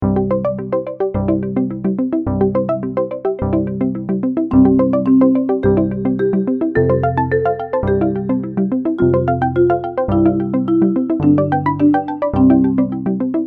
Twinkle Twinkle Little Star, ukulele; Rainbow Ukulele Play-Along

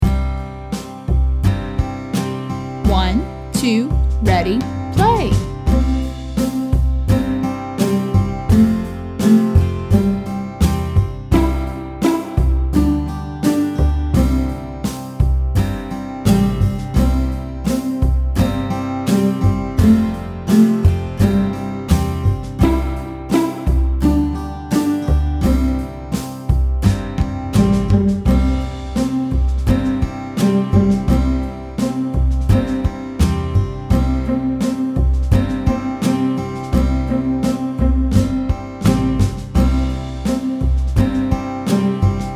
Old MacDonald SLOW Tempo WITH INTRO, ukulele; Rainbow Ukulele Play-Along

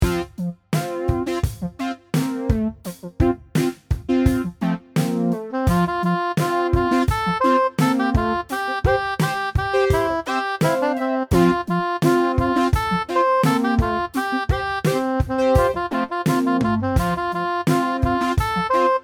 SLOW Tempo, ukulele; Rainbow Ukulele

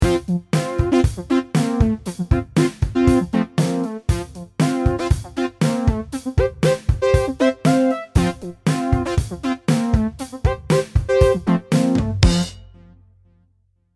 No Melody, ukulele; Rainbow Ukulele

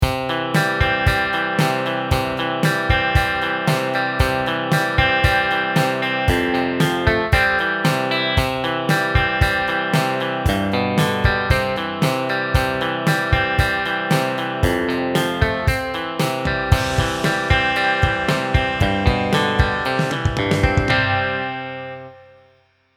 No Melody, ukulele; Rainbow Ukulele

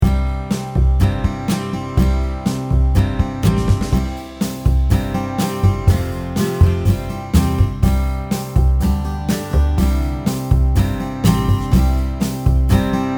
Old MacDonald Multiple Verse NO MELODY, ukulele; Rainbow Ukulele Play-Along

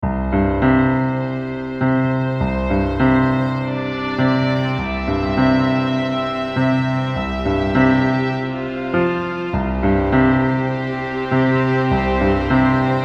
No Melody, ukulele; Rainbow Ukulele Play-Along

